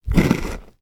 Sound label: eating on land hover; carbon cycle